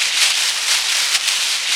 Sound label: RUSTLINGS; Zero-G Ambient 1